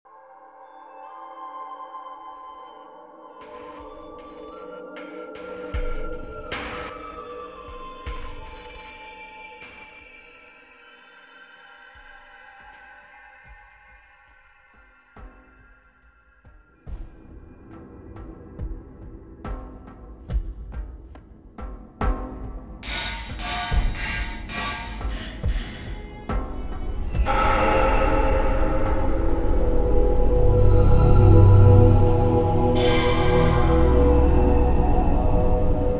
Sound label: Atmosphere